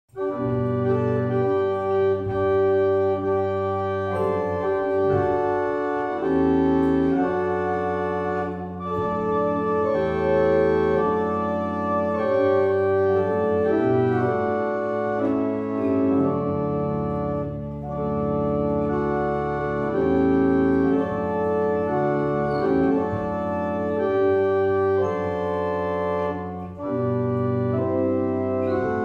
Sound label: Morning Hymn, barrel organs; Brightwell